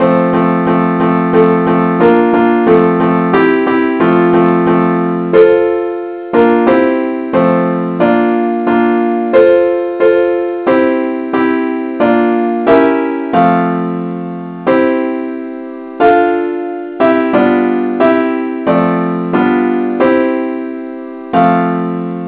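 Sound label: simple accompaniment, key f; christe Sanctorum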